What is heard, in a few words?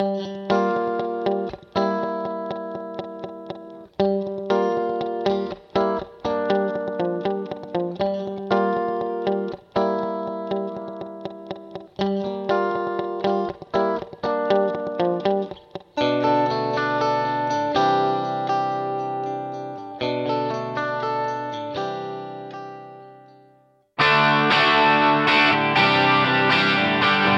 Guitar Yamaha Rev7 Percussion Plate Vintage Digital